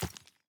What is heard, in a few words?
ore, walking; Factorio